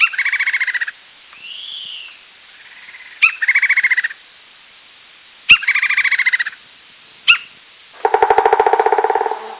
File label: forest bird close sonorous